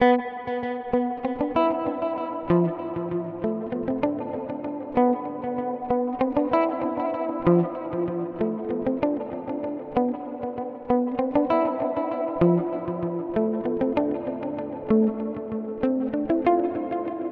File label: Delay Reverb Modulation; UAFX